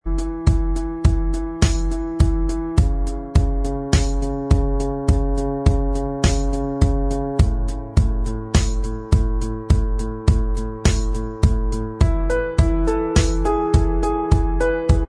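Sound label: instrumental track, backing tracks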